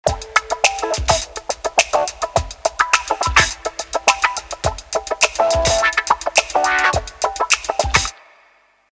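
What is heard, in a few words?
ringtone, Motorola A3100; sugar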